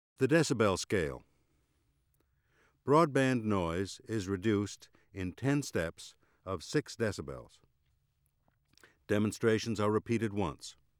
The decibel scale

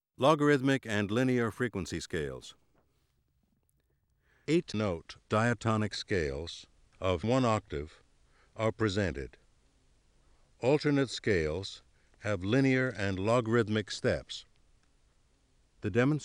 Logarithmic and linear frequency scales

